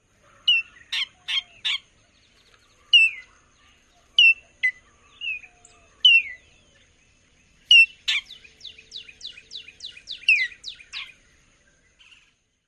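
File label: Altamira Oriole calls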